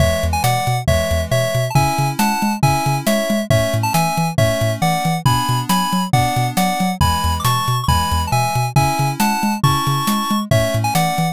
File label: bgm street